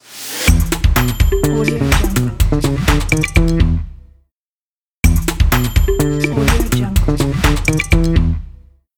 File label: bass guitar percussion logo; wolf-music